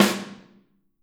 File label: Snare OH; Snare OH All; Drums Percussion